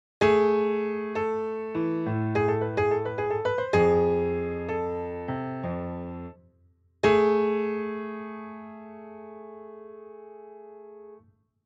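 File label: Major seventh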